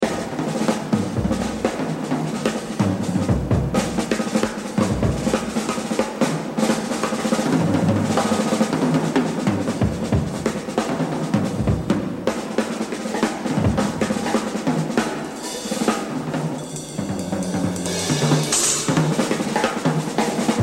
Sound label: Jazz Drumming; Etude 7B Buddy Rich - 100%; The Evolution of Jazz Drumming (Gottlieb)